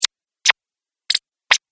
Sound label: 4 Game Sounds Blips; Video Game Sound Effects